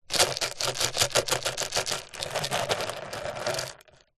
plastic pot containing small debris shake; zapsplat

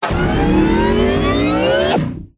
science fiction robot large mechanical movement

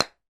ice chunk light impact chisel; sound effects one

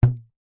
household hairband ping

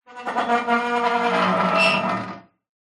household metal squeak groan gate open; zapsplat

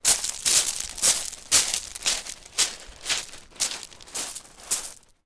foley footsteps on stoney beach

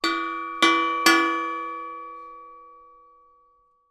industrial gas cannister medium hit ping x3

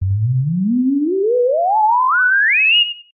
synth theremin robot power up rise; Jessey Drake

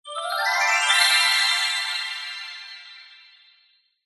fantasy magic glissando 90s style dreamy ascend

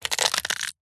horror bone break snap twist or crush; zapsplat

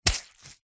foley wet cloth dab squelch soft single; zapsplat

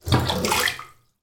household bath plug remove

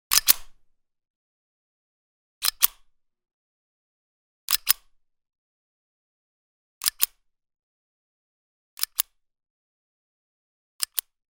gun bersa; still north media

